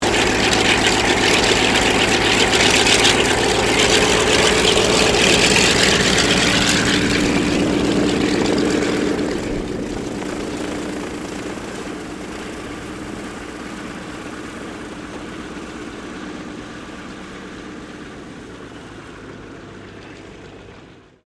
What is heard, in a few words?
AIRCRAFT CA-13 BOOMERANG TAXI STEREO; Airplanes (Sound Effects:Vehicles)